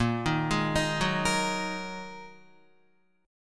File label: A sharp 7th Suspended 4th Sharp 5th down Guitar 4F 4A 5C 5G 5C 6E; arpeggiated; JGuitar